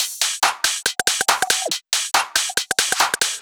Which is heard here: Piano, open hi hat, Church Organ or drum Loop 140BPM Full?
drum Loop 140BPM Full